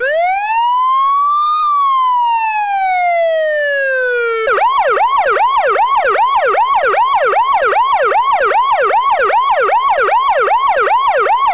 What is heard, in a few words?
PERFECT SIREN; Driver